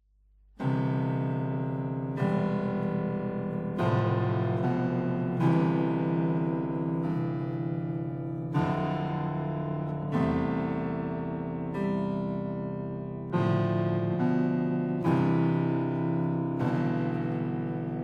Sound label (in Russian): klavesin; pogrebenie myortvyh